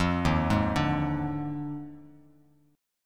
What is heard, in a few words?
D sharp Major 7th Suspended 2nd Suspended 4th, down, Bass Standard; arpeggiated; JGuitar